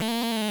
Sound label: goat; tetris